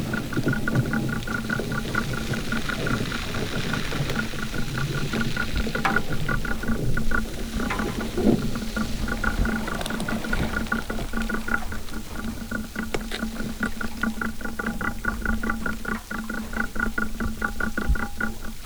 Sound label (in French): pelleteuse; NORTEK